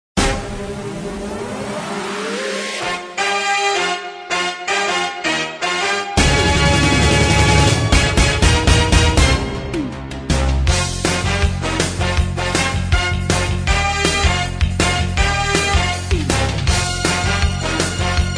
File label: theme Inst Track